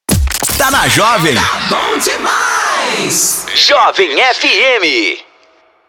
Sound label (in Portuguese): passagem; Jovem FM